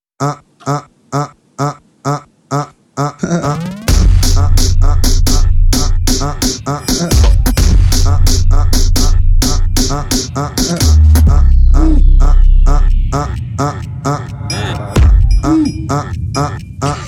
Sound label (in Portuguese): Base de funk